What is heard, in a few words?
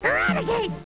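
raticate, Pokemon crys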